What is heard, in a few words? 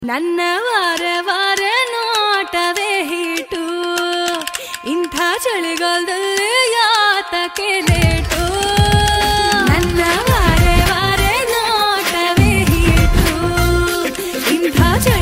Kannada Ringtone